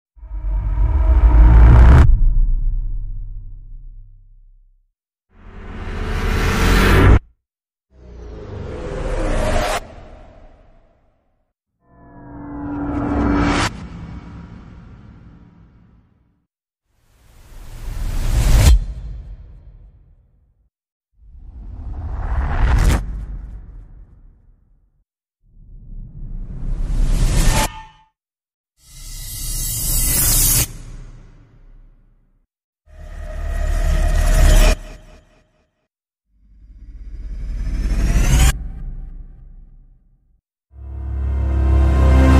Cinematic Riser SFX Rise and Ascension Sound Effects; for Videos